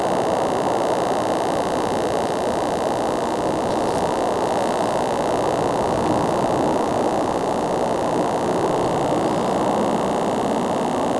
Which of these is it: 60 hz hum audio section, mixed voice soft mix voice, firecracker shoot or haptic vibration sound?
60 hz hum audio section